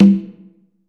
HALL PERC; Crypton - DrumBase5000

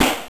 Snare, Genesis; Sonic Spinball; M8

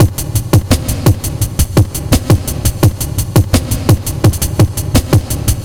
170, Drum Loops, Drum & Bass

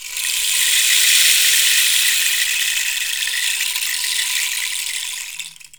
RAINSTICK; AKAI S6000 CD-ROM; WorldSounds

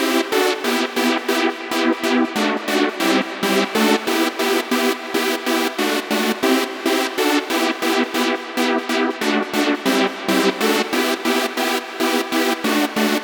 140BPM E; Vengeance - Essential Clubsounds Vol.4

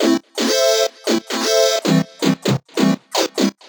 130 A, uk garage, Synths; 130bpm Lines n Loops

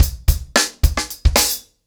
Hip Hop 110BPM, acoustic drums drumloops

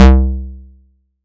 SY QK BASS; ModelCycles

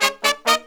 132, Pop & Funk Brass

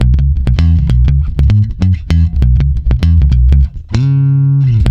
C#, swing mute, bass; Spectrasonics; Bass Legends

